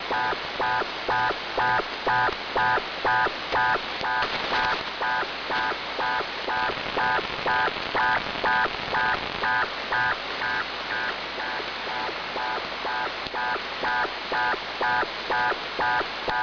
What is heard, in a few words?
11151 kHz USB